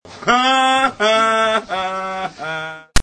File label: haa haa